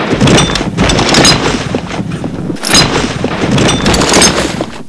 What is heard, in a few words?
glider satchel